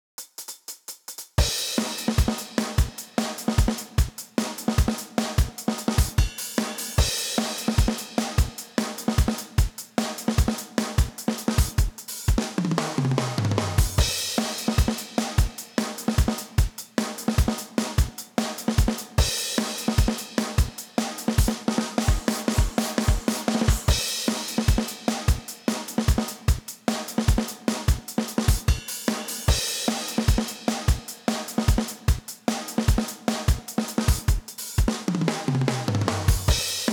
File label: drum